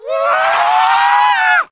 zombie scream

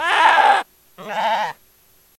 Sheep; Runescape

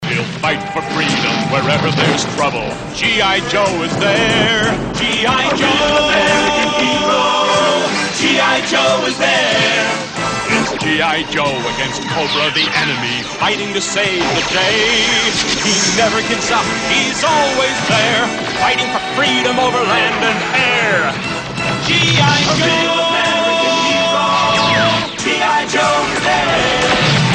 Cartoon Intro; Gi Joe

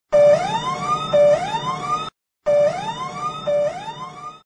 Heli Fire Alarm

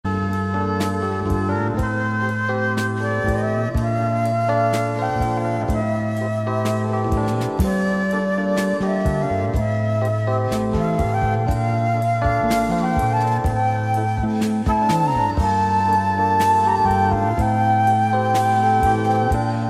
Heaven Flute

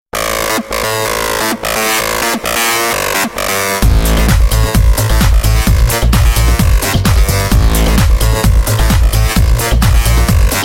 New Bass Tone

